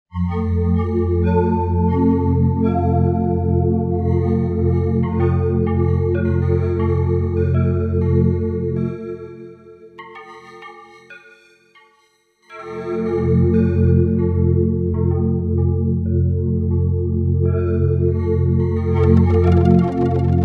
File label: Yamaha SY77, Chrome Tick; pads and textures